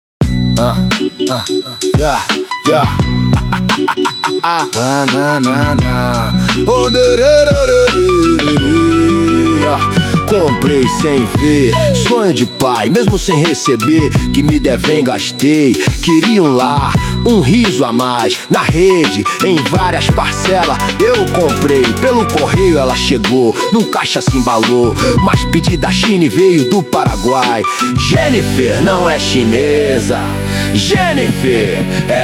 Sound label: Add Vocal